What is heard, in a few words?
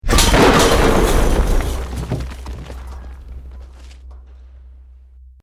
BUILDING COLLAPSE SCAFFOLDING SMALL